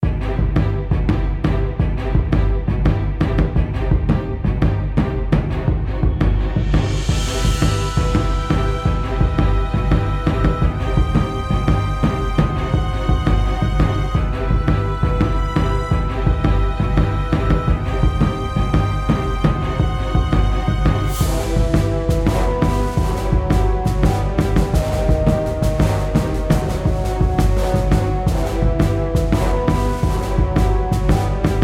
Medieval Battle Theme; Last Dream World Unknown

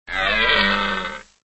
deer pain

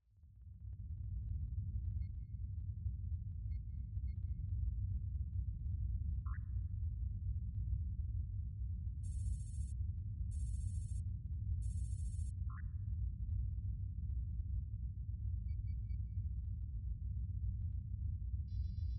Ambient Interior; Star Fox Adventures; Greatfox

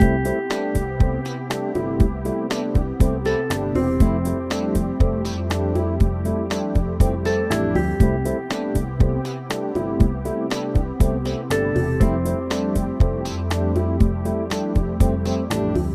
Elevator; widgets odyssey; psp

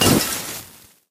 Lantern Break

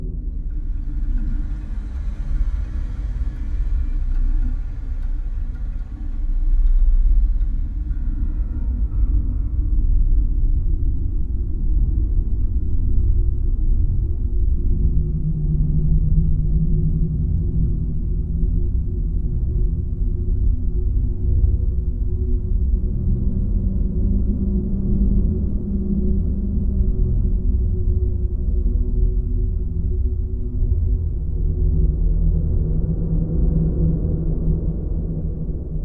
ambience loop

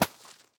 tools snowball

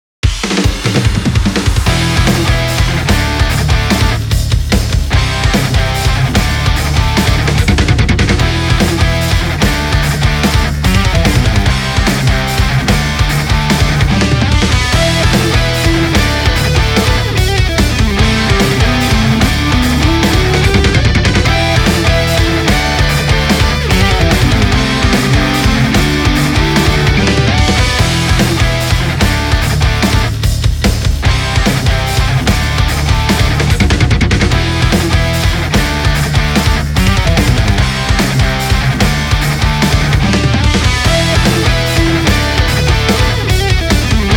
Battle BGM; GITADORA NEX+AGE

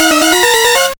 Beat

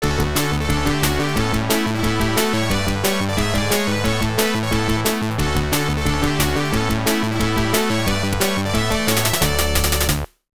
Jingle; m.v.p.; system 16b

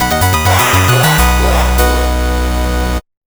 new high score; Jack B. Nimble

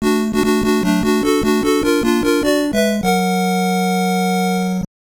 Jingle- Fanfare; Wizardry II Legacy of Llylgamyn; NES

